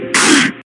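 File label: silly and strange grumbling worthy of memes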